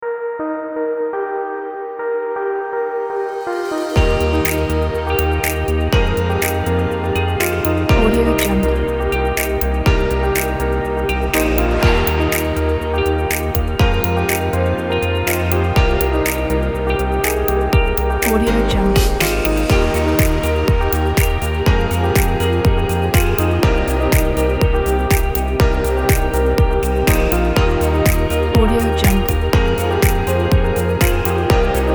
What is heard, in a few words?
indie corporate